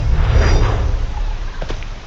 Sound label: level up; kena bridge of spirits